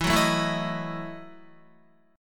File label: E Major 7th Suspended 2nd Suspended 4th E, Guitar; strummed; 4F,4A,5C,5G,5C,6E; JGuitar